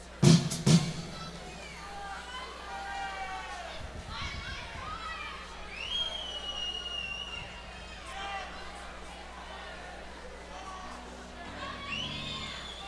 Tuning-Breather

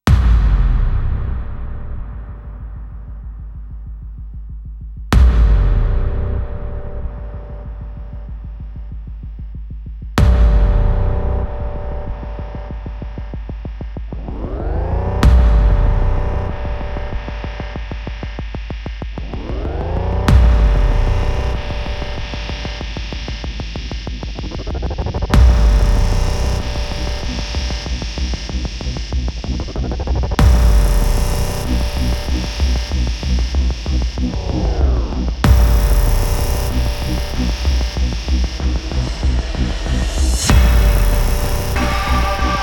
Beacon, sound design

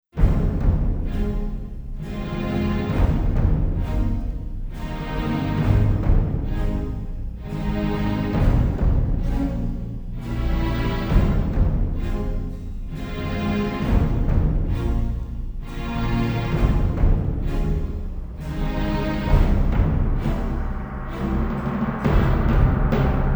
Coronation (No Choir), Orchestra

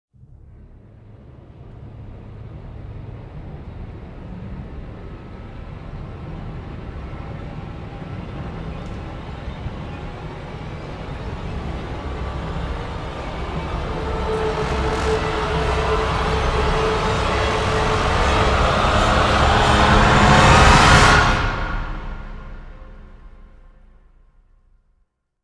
Orch & Choir Rise

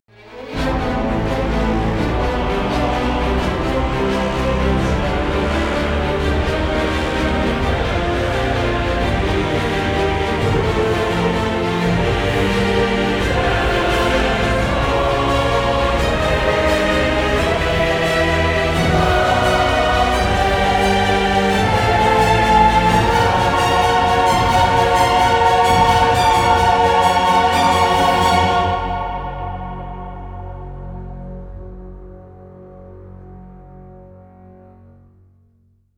Orchestra And Choir Only, Epic Choral Action; трейлерная музыка

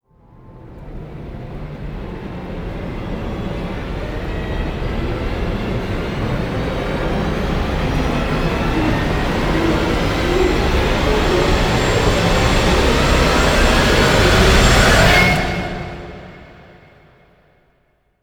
Orch Rise

